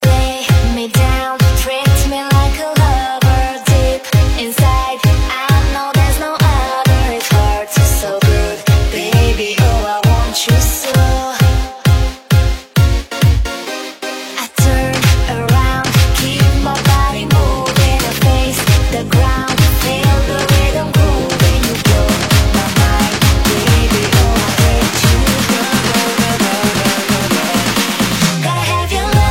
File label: Vibe Dance